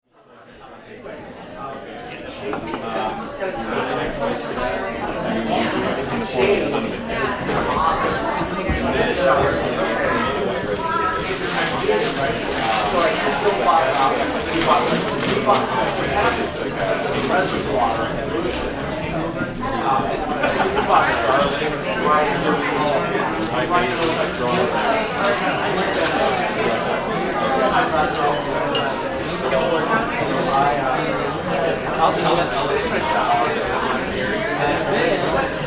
restaurant ambiance

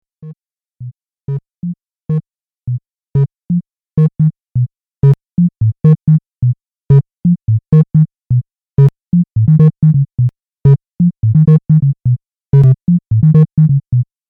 step sequence

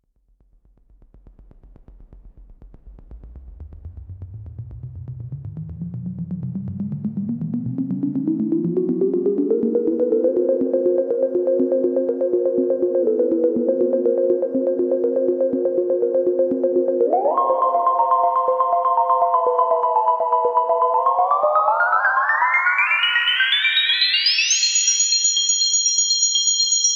Arpeggiated Filter Self Resonance

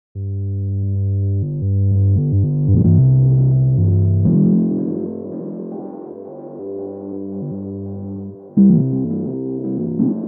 octave pedal rhodes

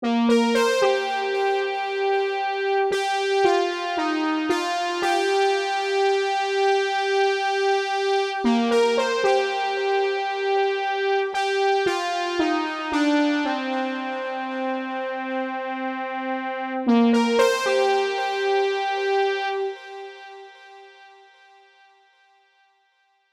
Brassy Strings

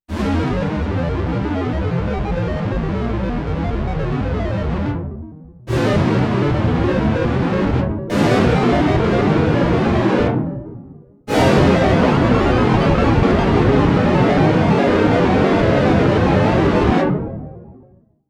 LFO on random pitch

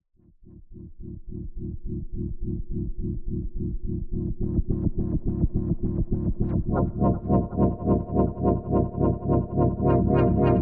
Sync and Filter Modulated Chordal Drone

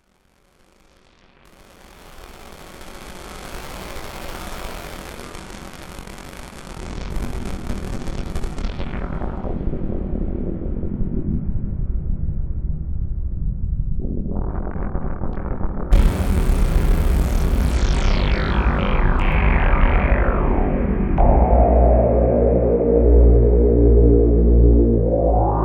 Synthesizer Noise Jam

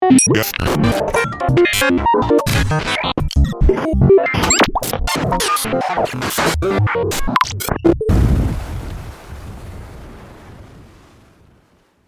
micro samples

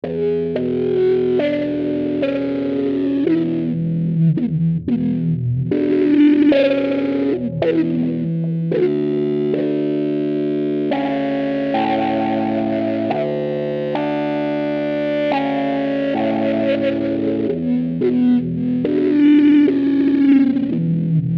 110bpm processed rhodes

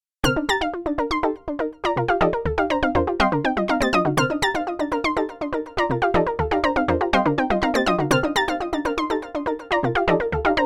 MDA JX10 Emulator

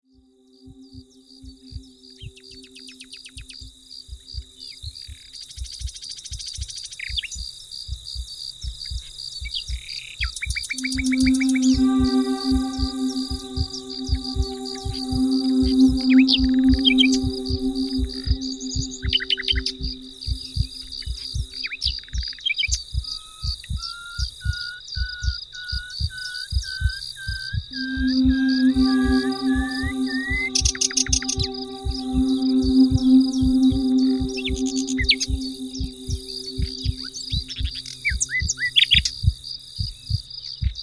lovely loop

